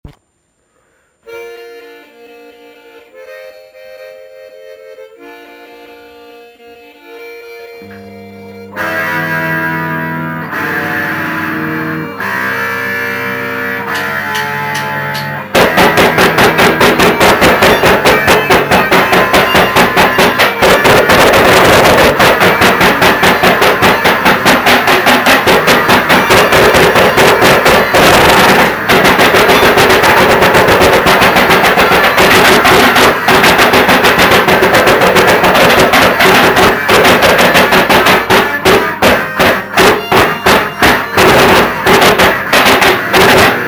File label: Instrumental